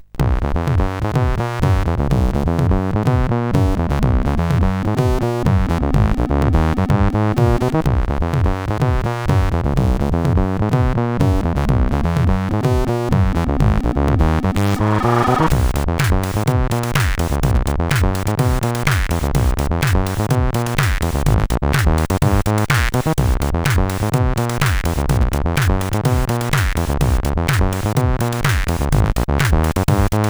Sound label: sid, CSG8580; Dirty Loop; hvsc